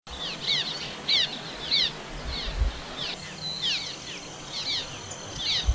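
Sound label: cliff swallow voice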